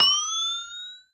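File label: BELL HIT, bicycle; bells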